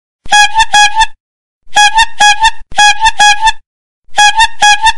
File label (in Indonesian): Efek Suara Toet Toet